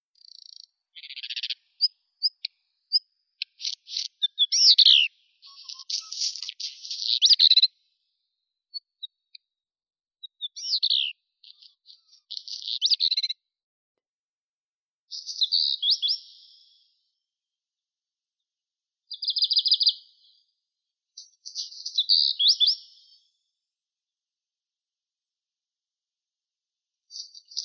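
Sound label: black redstart, phoenicurus ochruros; gorihvostka chernushka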